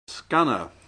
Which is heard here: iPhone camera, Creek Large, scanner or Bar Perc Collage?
scanner